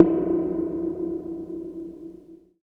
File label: SNARE Tune, 808; Drum Kit; Polyend; Stazma & Concrete Collage; Spring808